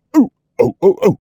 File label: Man Dance Happy; Zomboid; Lifestyle